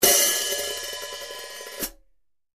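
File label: hi-hat, ZBT 14 Rock Hat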